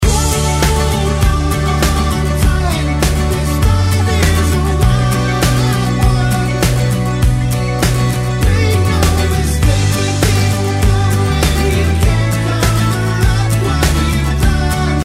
B Vox Clean

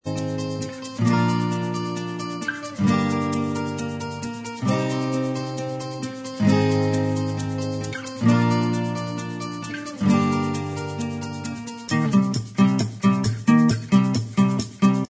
acoustic version Inst

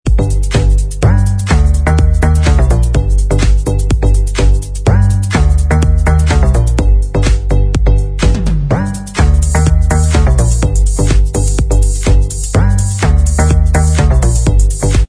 Inst Track

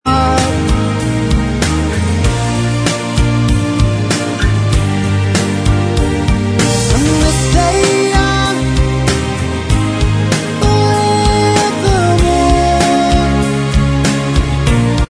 backing vox

